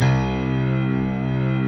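bright electric piano strings, Slow Octave Piano Strings; Optical Media International - Sonic Images Library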